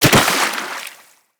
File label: Land Soft Water, Footsteps; Characters; Operator